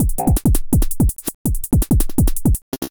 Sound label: clones snare, Breaks; neuro; Sinistarr